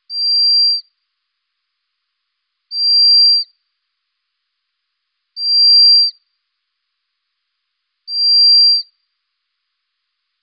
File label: Rhinolophus blasii